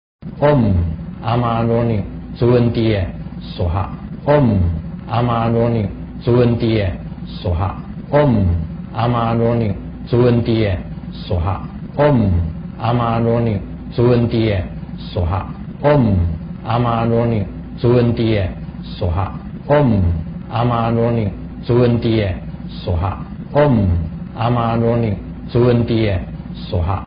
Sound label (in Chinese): 長壽佛心咒 Amitayus Mantra